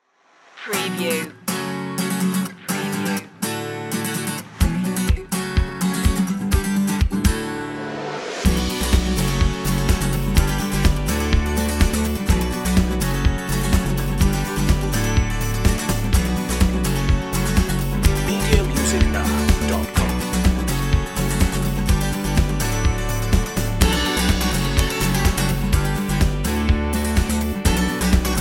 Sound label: inspirational acoustic guitar